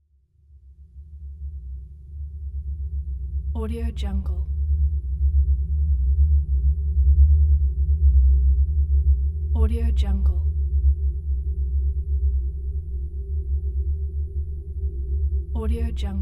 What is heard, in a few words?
sunken ocean sound effect